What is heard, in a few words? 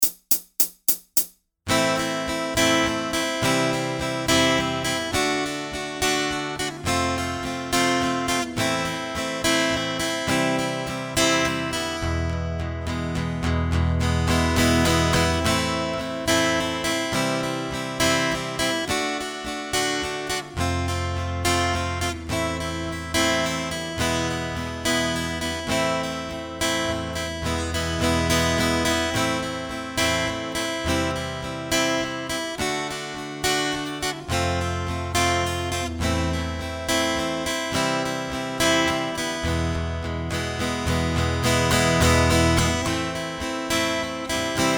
Chords, guitar; no fingerstyle; House of the Rising Sun; 1 MINUTE MELODIES STARTER